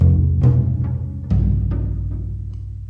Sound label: 073 bpm low drums